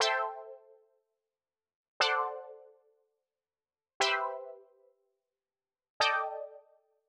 120 Stabs A, Keys, Nu Jazz House; PowerFX

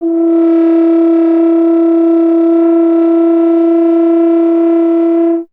F. Horn; Miroslav Vitous; Solo Instruments VOL-2

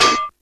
METAL, ELEC PRCS; East West; Global Sounds

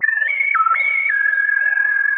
Quasar Whistle 110BPM

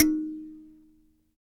Dyna Sansa, Africa

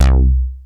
BASS C2; Q Up Arts; Dream Experience

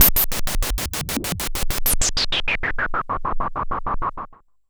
Pulse, Filtered Noise

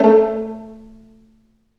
Vlns Pizz; Roland L-CD702; Vls Pizz

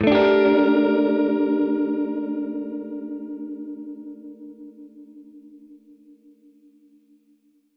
Gretsch Chord Gmin[add9], Guitar Chords; undercover samples

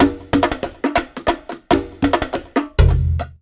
Conga Groove, Percussion Loops

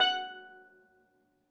f#1, steel, percussion; Giga Samples Collection

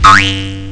acid boing; Amiga Soundtracker Sample Packs

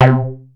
OB C4 -L, Rare Synthesizer; Hollywood Edge - Giorgio Moroder Rare Synthesizer Collection; OB 4 VOX.

